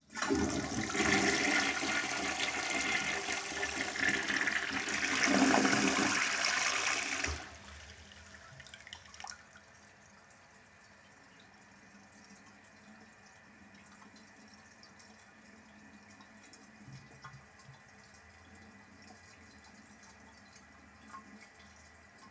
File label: Toilet Flush Fill Slow; Blackmagic Fairlight